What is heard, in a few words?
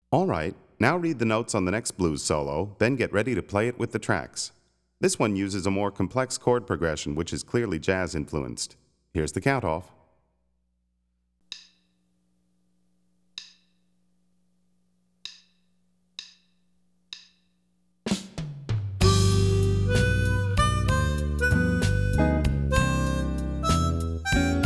Twelve-Bar Blues Solo, harmonica; Play Harmonica